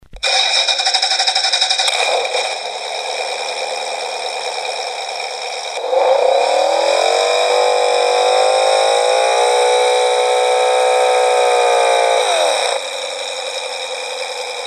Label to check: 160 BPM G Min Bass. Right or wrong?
Wrong